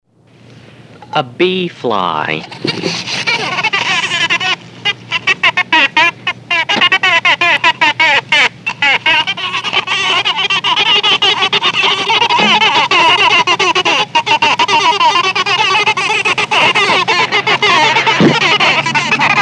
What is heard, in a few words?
Bee Fly